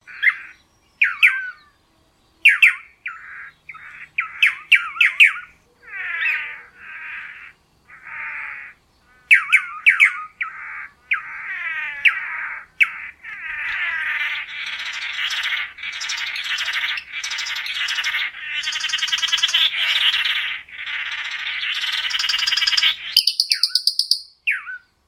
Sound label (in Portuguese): Canto do Tangara